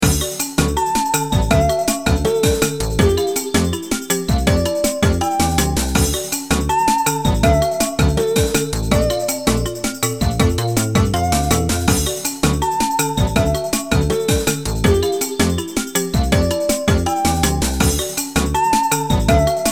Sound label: Choosing a Treasure Chest